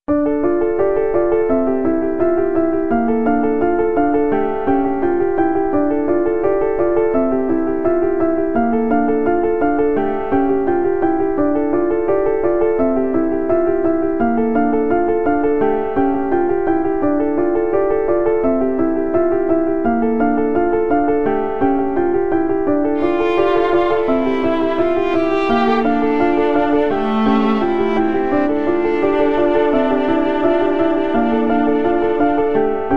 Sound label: Sad Piano; Music